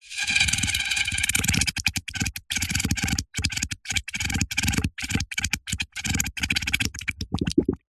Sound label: foley wet mud air blow from straw onto surface small bubbles